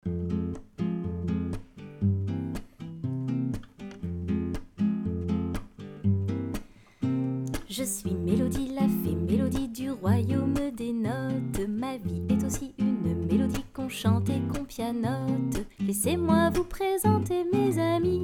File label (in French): Melodie Et Les Notes